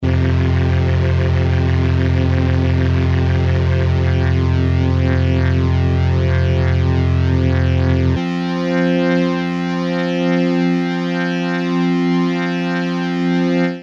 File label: prodigy rotosphere